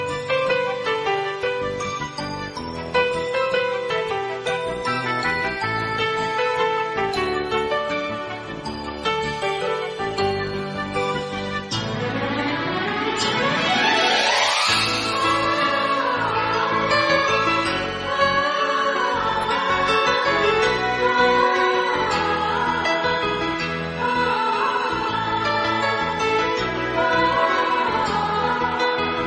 Ringtone Instrumental Piano

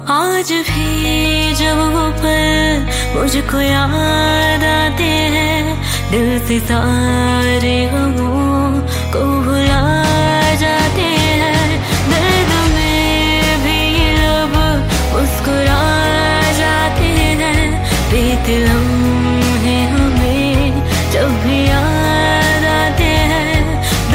Old Hindi Song Ringtone

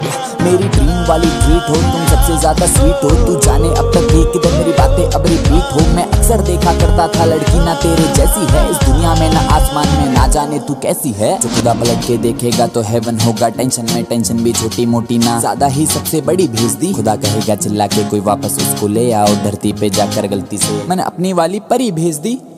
Hindi Rap Mix Ringtone; ringtones

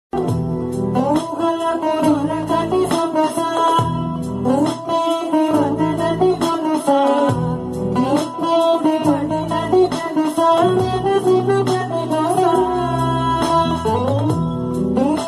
Ringtone Instrumental

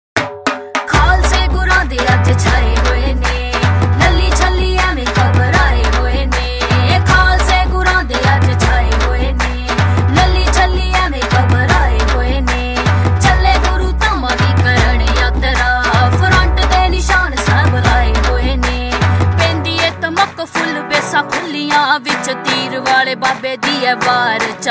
New Punjabi Song Ringtone

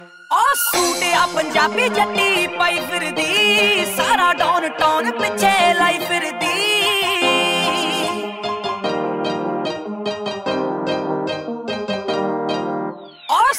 Punjabi Ringtone

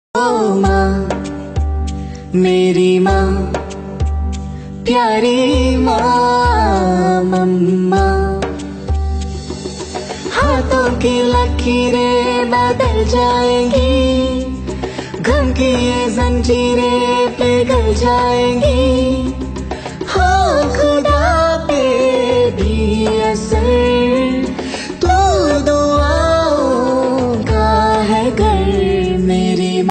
Female Ringtone

